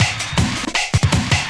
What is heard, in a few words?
Drum & Bass, 160 BPM